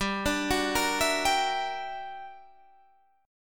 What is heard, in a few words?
G Minor Major 13th, G; GmM13 arpeggiated; 15,17,16,15,17,15; JGuitar